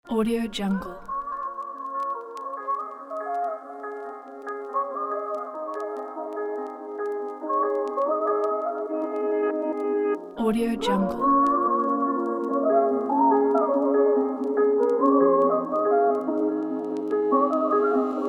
soul hiphop trap beat pack

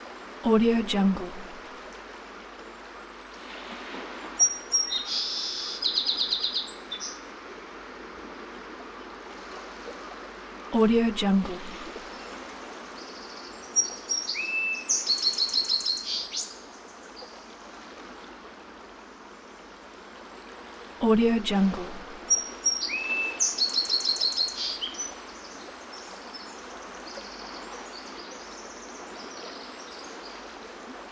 singing birds on the seashore

